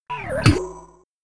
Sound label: Heavy Metal Jingle; amiga